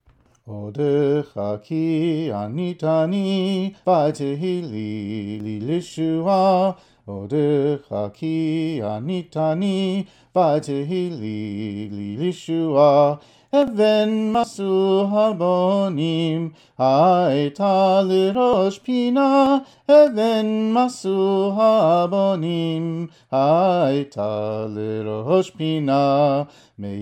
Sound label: Odekha sung